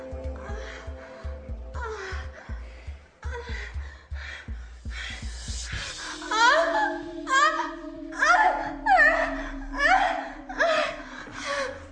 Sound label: argh